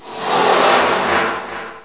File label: steel